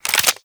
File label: Clip Out, Weapons; Lato Vandal